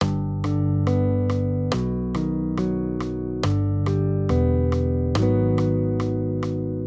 Accompaniment, Major Triads, Root Movement Four Semitones, G-B 140